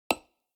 construction wood

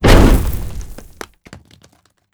siege projectile hit, attack impact

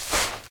fs sand, singlesteps

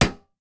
sling metal, attack impact

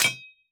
mine metal

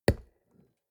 lumber tree

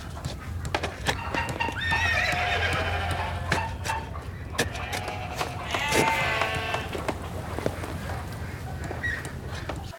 port, ambient

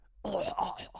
male death

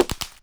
arrow wood, attack impact

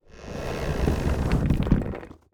mine stone expire

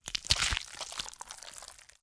gather meat

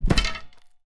spear attack

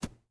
arrow dirt, attack impact

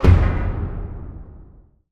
warship attack cannon; ships siege

